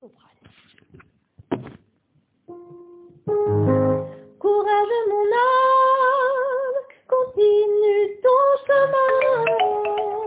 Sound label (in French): Soprane